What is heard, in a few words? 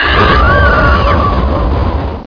blast; mod quoth; polyp